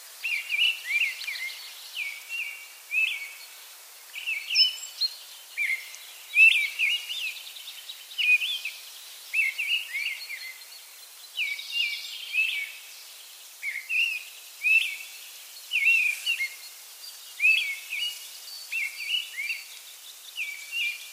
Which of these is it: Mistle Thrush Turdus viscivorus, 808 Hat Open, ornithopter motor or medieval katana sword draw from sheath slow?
Mistle Thrush Turdus viscivorus